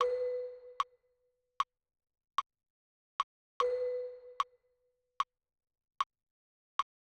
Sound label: Click 2 bars